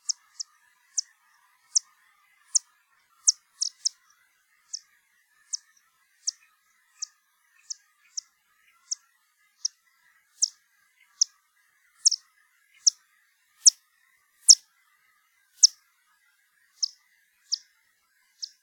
bechsteinfledermaus, fledermausrufe; fledermäuse rufe

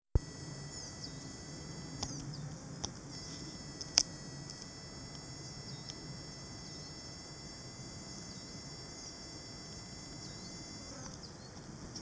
schwebfliege; was summt

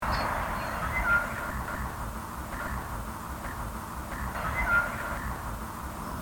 eichelhaeher imitiert pirol; Eichelhäher imitiert Pirol; Vögel

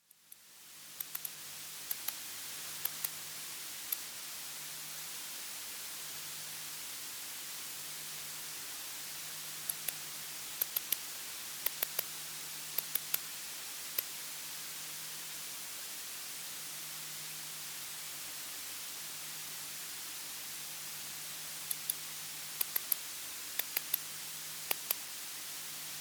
laubholz-säbelschrecke, heuschrecken

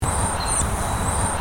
beutelmeise

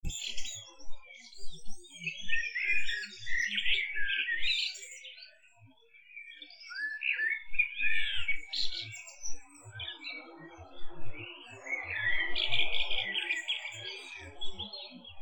amsel, rufe vögel; voegel

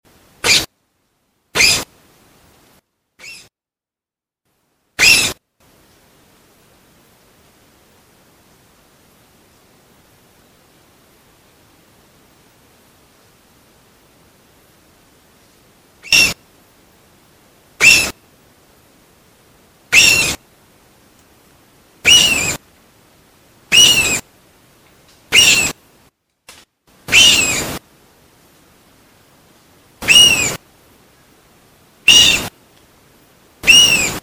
siebenschläfer, nachttiere

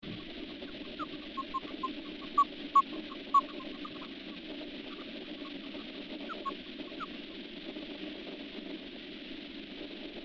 alpenfledermaus

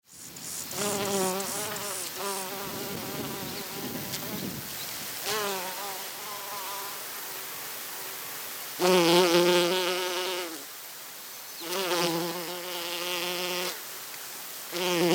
honigbiene; was summt

